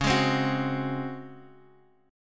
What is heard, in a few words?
Db Major 7th Suspended 4th, Guitar, Open D